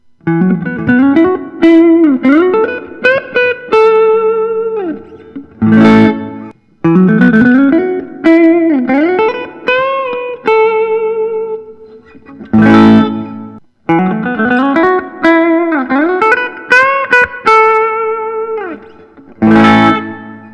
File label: prs mccarty 594 10 top